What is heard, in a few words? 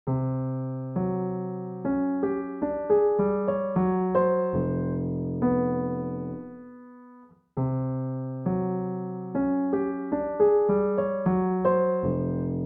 Waves piano